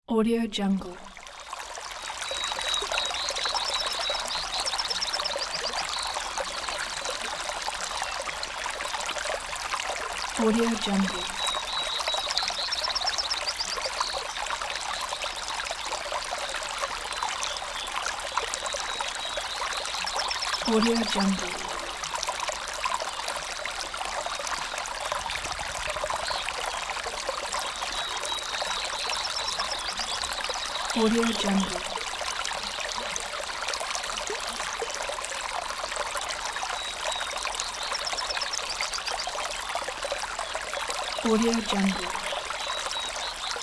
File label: brook in forest